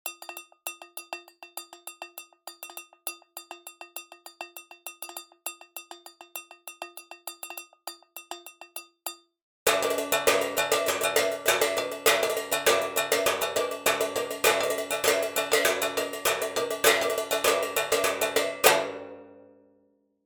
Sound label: metal example dry